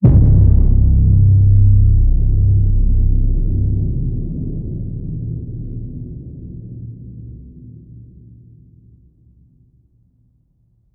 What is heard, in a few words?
HIT SciFi Low, Cinematic Hits Designed